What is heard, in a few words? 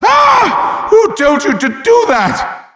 headbomb hit